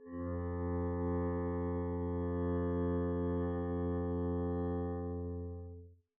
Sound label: arco f2 pp up, double bass